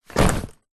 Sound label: foley garment bag fabric drop fall to carpet floor; zapsplat